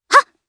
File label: Vox Jump jp; voices; heroes; Nia; kings raid